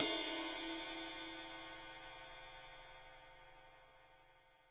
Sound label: HiHat & Crash, Roland TD-20; HiHats